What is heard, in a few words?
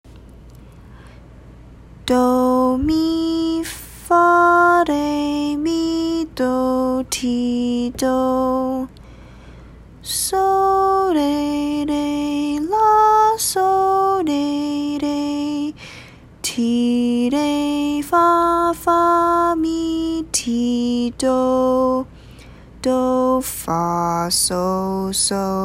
patterns recorded